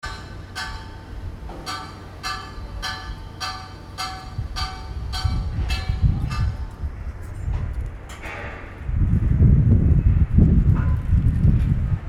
singapore clacks

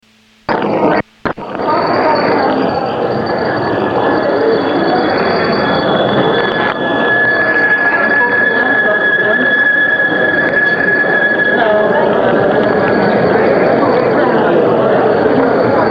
shrine dictorphone mexico, street recording

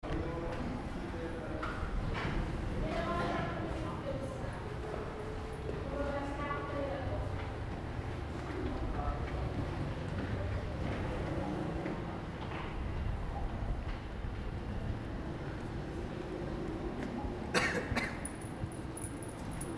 ambience inside, Cambodia phnom penh; 2019

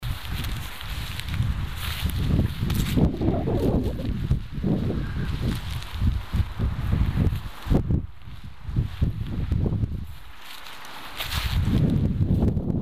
wind rummble, Spanish street recordings

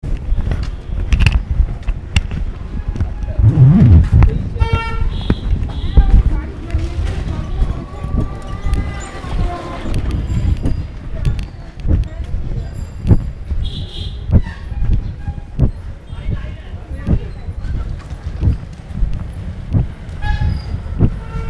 Morning racket, North India

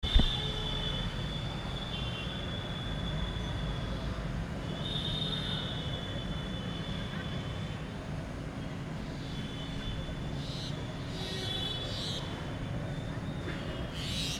morning traffic, North India

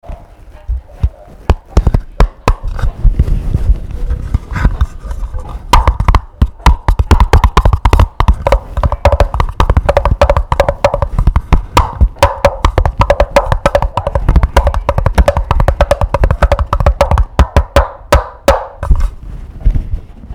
clack box noise; 2019; Cambodia phnom penh

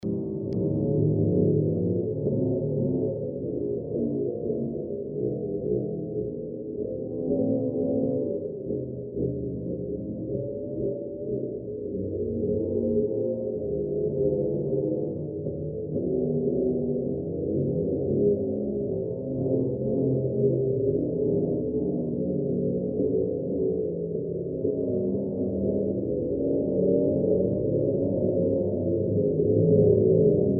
electric gamelan GHOST VOICES

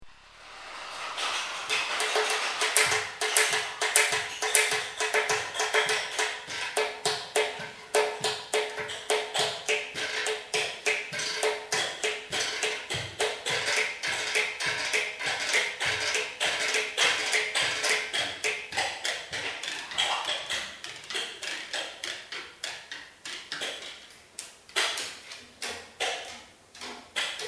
arabic scrapes and taps, street recordings

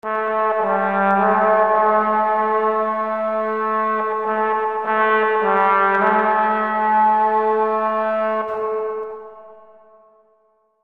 fanfare deep horn

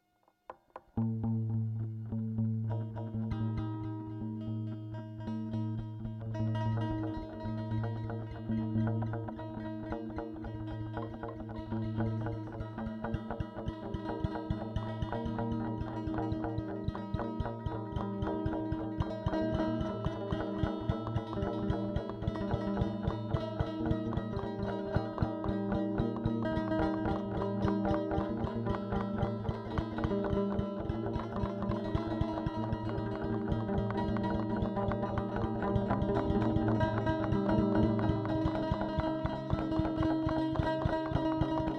Raining violins; Synthasis

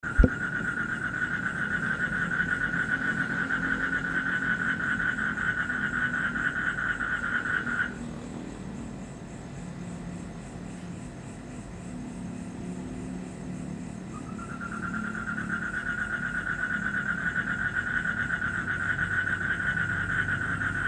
frogs, Indonesia

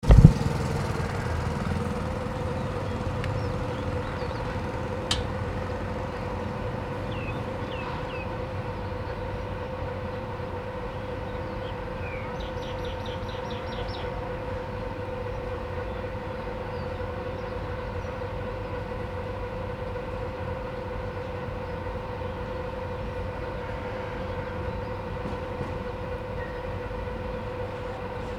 streets ambient noises, phnom penh; 2019; Cambodia phnom penh

